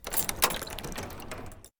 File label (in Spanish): abrir cerradura llave